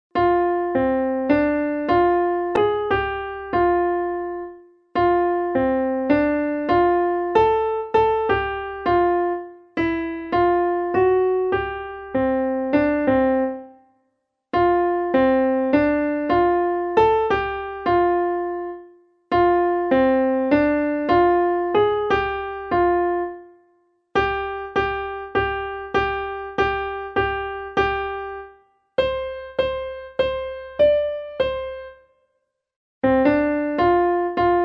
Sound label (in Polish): familijny rock